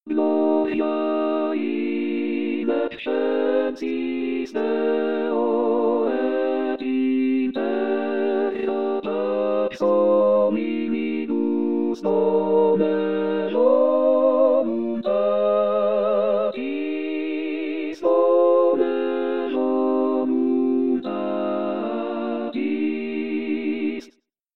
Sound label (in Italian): Gloria Ritornello Coro; messe